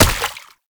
Proto Infested Step Water; Warframe; Brachiolyst; Steps

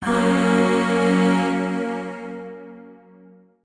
Shawzin Hirajoshi Chord; Warframe; Zariman; Void Shawzin; Chords

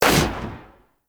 SMG Shot, Weapons; Warframe; Tenno; Protea

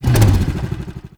Motorcycle, Engine Rev Stop; Warframe; Vehicles; Motorcycle Ninety Nine

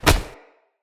Hoverboard Grab; Vehicles; Venus

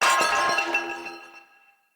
Corrupted Battle Dog Bell Swing Long; Foley

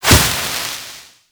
Hazard Sparks Start; Gameplay; Stadium; Warframe; Nineteen Ninety Nine

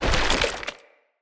Corrupted Slug Hit React; Creatures; Movement

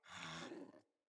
Kubrow Puppy Sleep Idle Snore; Vocals

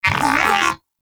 Moa Pet Tenacious Agree; Pets; Warframe

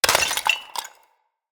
Vase Clay Small; Vases

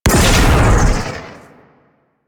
Crewship Heavy Cannon Fire; Vehicles; Crew Ships; Weapons; Warframe; Rail Jack; Corpus Crew Ship

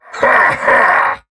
Heavy Laugh; Warframe; Battle; Dax; DaxHeavy

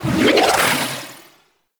Heal Wave Cast; Warframe; Anima Animus

